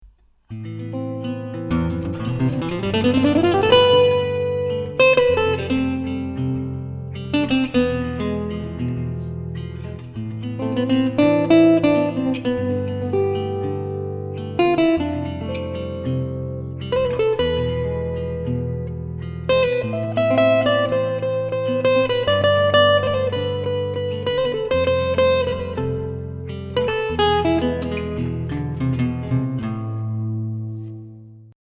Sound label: gypsy impro